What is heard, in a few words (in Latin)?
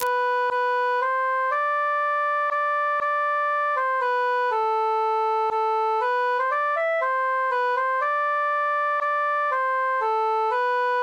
cantus solo; sing we and chant it